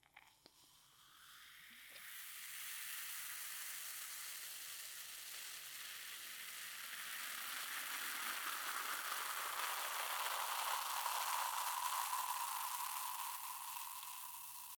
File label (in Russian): zvuk gazirovki